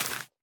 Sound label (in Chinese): 竹笋放置声